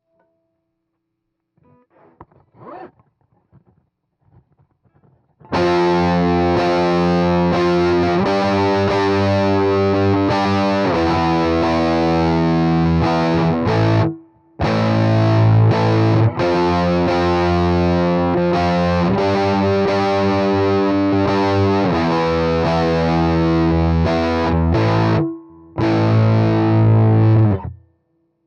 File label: Heavy GTR